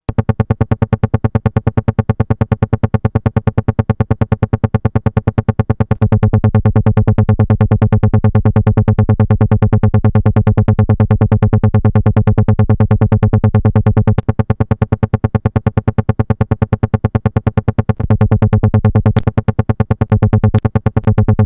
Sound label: Plan B Model 13 Test